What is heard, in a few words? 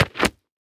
Foley Footstep Baseball Cleat Dirt Debris Single Step; Foley Footsteps